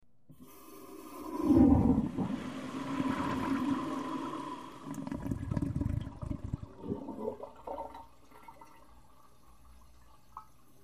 Slow Flush Of Toilet #5 Handle Squeak And Normal Toilet Flush, X5